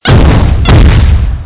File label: footstep; cstrike; Teddy CSO